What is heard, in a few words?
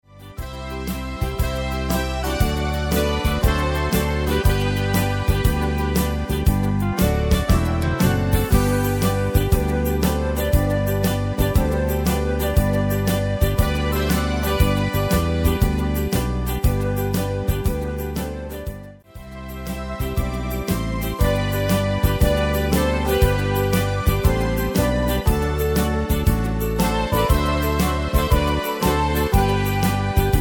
Shuffle Mix